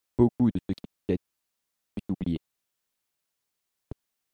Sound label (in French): noise gate violent